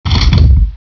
Pick Remote; Miner Max

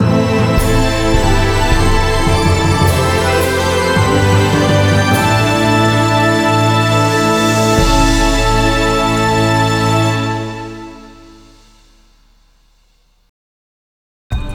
Final Stage Completed (Arranged)

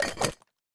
PickUp Ceramic

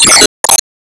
Swingpower Shot

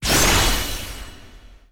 Enemy Revive

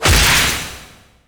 Enemy Gear Common Delete

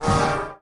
Parade March; lethal company

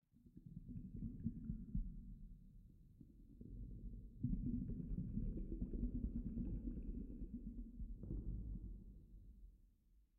underwater bubble; underwater bubble2

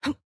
Attack Junk; Going Under; Jackie

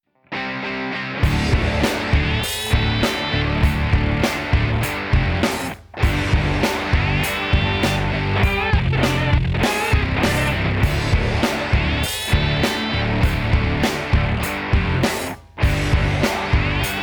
Stoner Jam; monster energy supercross the official videogame 2

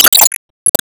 Wall hit Spread bullet

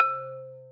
lead marimba c3; gamerip; lofi room demo

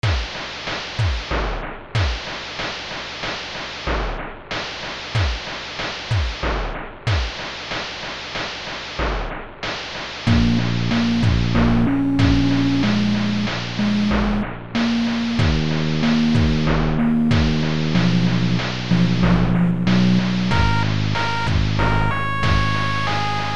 Hip Hop; Caveman Story; Hip Hop Tyrant

